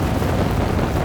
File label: mage chains; LoL; ironforge xerath